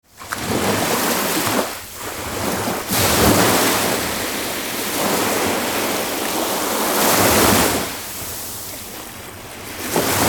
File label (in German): Meeresrauschen - Entspannung fuer Koerper und Geist